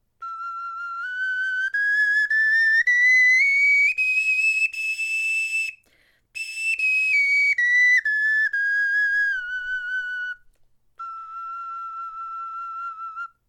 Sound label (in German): tonleiter, knochenfloeten